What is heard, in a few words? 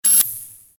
click advance; jinteki